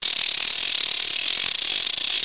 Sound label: Clock Winds